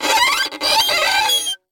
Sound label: Cartoon Violin String Scrape